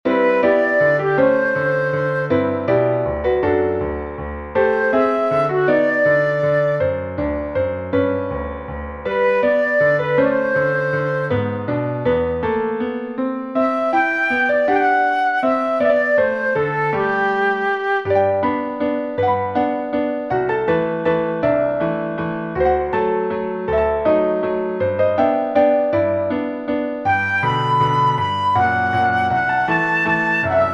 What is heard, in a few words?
waltzer for flute and piano